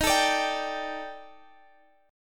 D sharp Major 7th Flat 5th, Guitar, Half Step Down